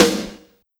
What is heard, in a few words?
reverb snare drum sample a sharp key; Duration 0:01